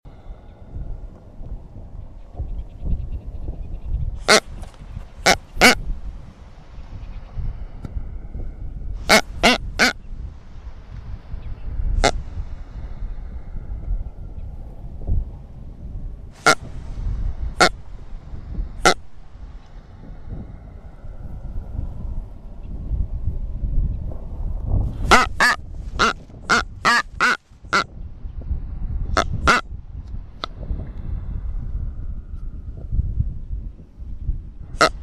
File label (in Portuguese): atobas